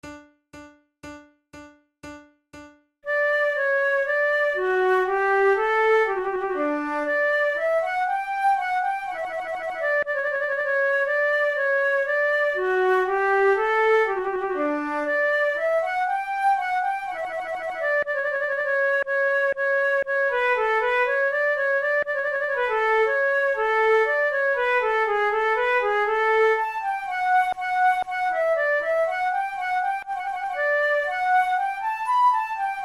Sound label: flute